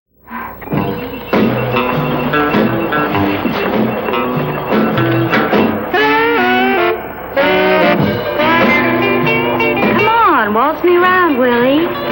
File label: Untitled instrumental